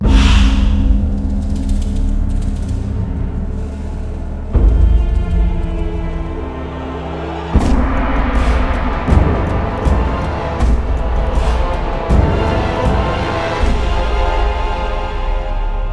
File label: OPEN PORTAL